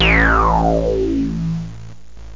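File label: FM Moog Bass Sweep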